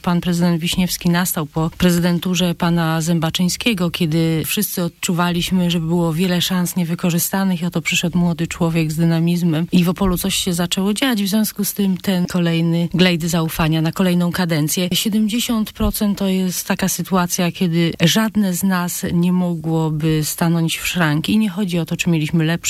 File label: viola